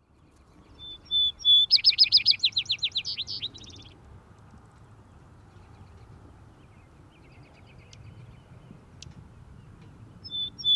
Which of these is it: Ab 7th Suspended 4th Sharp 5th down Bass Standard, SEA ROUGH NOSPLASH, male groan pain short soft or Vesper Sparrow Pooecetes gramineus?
Vesper Sparrow Pooecetes gramineus